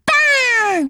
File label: charge shoot; m4mod